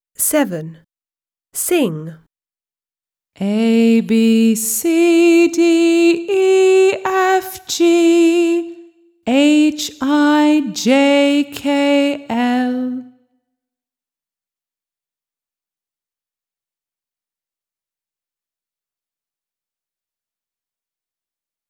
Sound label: song ABCDEFGHIJKL, British; My alphabet book; Smart Book 1